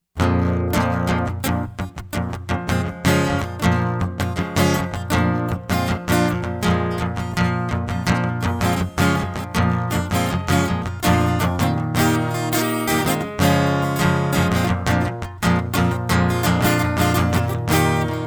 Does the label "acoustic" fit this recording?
Yes